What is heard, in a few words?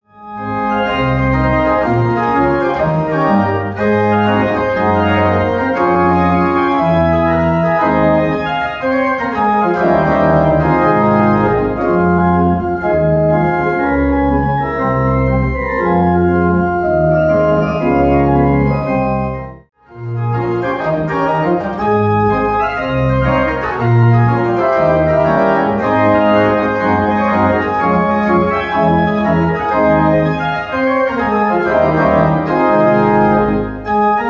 Jazz-Pop